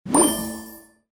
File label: start